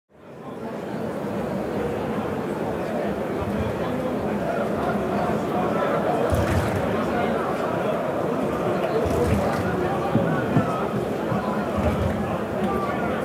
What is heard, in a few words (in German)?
Stadion Atmosphaere